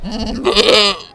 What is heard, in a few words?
sick